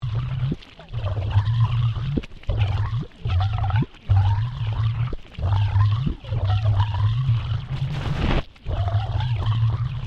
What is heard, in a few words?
Boat Ferry Freshwater Class Sydney Mic Dragged Astern Submerged; Manly Ferry; Boats; Vehicles